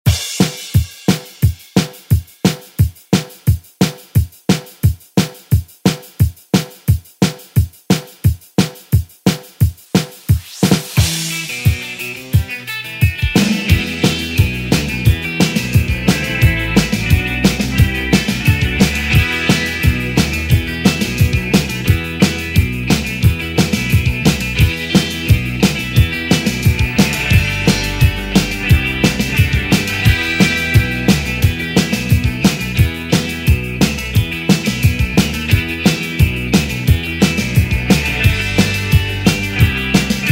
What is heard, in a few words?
80s ReDrum; DJ Mhark